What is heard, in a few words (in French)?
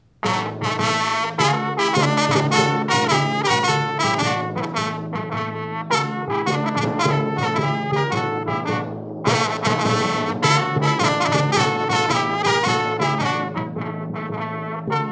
sonate